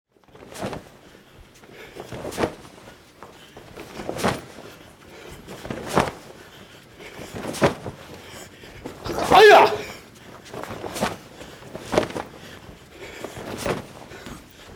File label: karate kicks in air